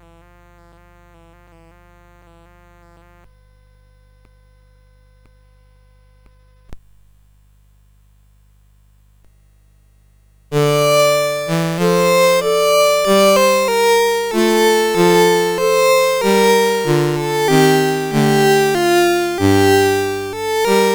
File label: CSG8580; Venus to Mas Pris; CSG8580R5